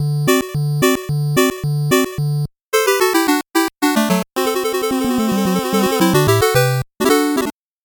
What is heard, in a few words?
8bit jingle slow